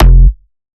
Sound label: Bass F, Bass Synth